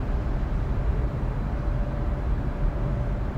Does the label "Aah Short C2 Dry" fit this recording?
No